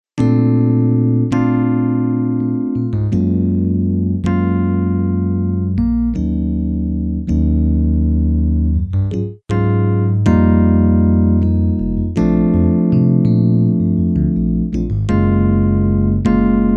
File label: Electric Piano